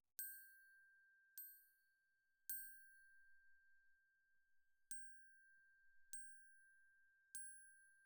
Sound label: Glock Close